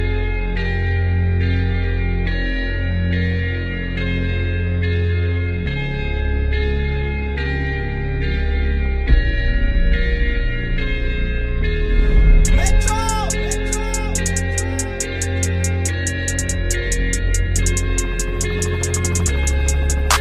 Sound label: sweet english music ringtone